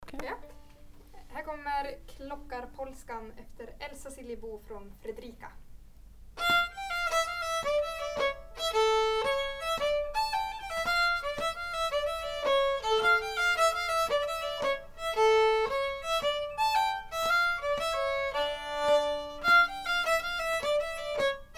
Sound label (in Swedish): Klockarpolska